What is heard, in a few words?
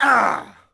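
Axeman Hit Vox; Civ4 warlords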